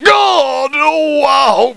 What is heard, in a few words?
hit; BattleSNDS